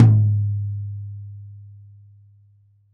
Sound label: RAW TOM, Drums Acoustic; AKAI; 90s sample CD; USB Soundscan vol.10; RAW KIT 4